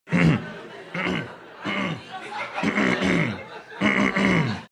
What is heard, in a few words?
clearing throat multiple times